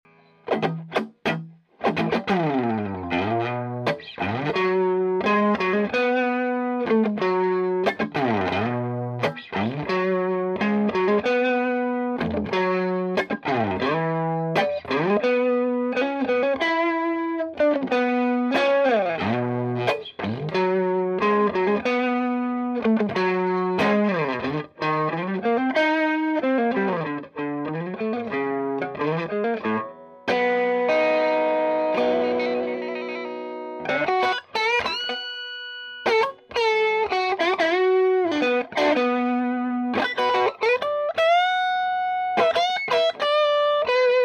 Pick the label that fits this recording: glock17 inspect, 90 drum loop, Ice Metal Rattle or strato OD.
strato OD